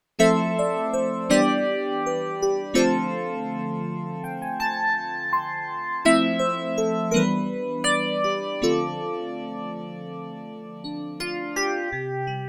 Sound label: Kawai ES 520 Modern E Piano